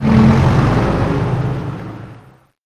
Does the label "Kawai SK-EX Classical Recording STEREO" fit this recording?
No